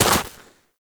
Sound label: Walk Snow; footsteps; Warframe; Operator